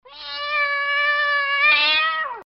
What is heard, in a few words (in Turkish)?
kedi, kedi sesleri